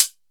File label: low treble hi hat sound a sharp key